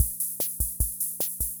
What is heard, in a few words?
Kent K-220 Rhythm System Loop, drum machine